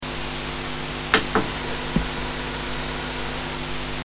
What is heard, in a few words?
Tascam Model24 Noise Stereo Track DAW Rewind